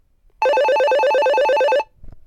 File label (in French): Telephone GIGASET DA710 Sonnerie